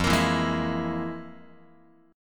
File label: F Minor Major 7th Double Flat 5th, F, Guitar; FmM7bb5 strummed; 4A,4E,5A,5D,5G#,6C#; x,1,1,2,0,3